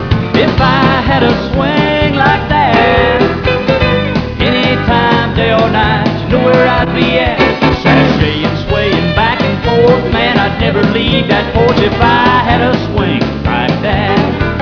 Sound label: swing